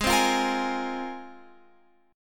Ab 6th Add 9th Ab, Guitar, Open F; strummed

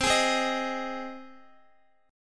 C Minor Sharp 5th C down Guitar; Cm#5 strummed

